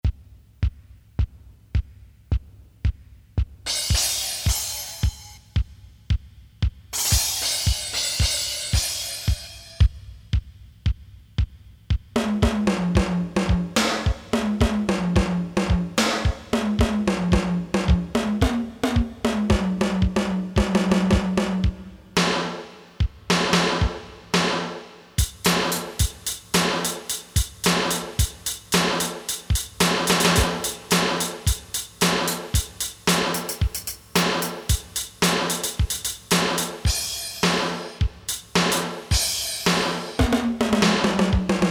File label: SPLASH, Drum Kits, Korg DSS-1